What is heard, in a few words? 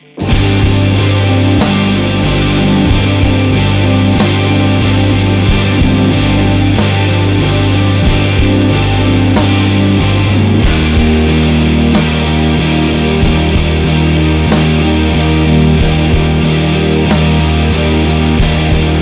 Bass BD SC